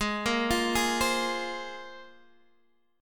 A sharp 7th Suspended 2nd G sharp, guitar

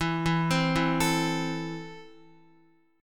E Suspended 4th Sharp 5th E, down, Guitar; arpeggiated; 4F,4A,5C,5G,5C,6E; JGuitar

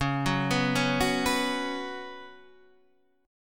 C sharp 7th Suspended 4th C sharp

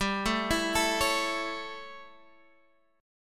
A 7th G, chord

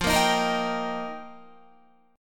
F 7th Suspended 2nd Suspended 4th, Guitar; F7sus2sus4; strummed

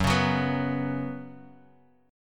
Gb Suspended 4th, Bass Standard; strummed; JGuitar

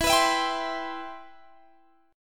E Minor Add Flat 13th

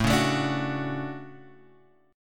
D Major 7th, chord

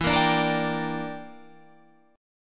E Minor 7th Flat 5th, Guitar, Half Step Down

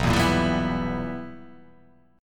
B Major 7th Suspended 4th Sharp 5th, chord

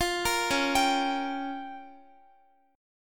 Db 6th Flat 5th, Guitar; Db6b5; arpeggiated; tuning=4E,4A,5D,5G,5C,6F; x,x,15,15,13,14

